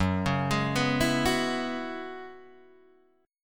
Gb 7th Suspended 2nd Suspended 4th, Guitar, DADGAD